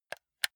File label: console controller r1 press slow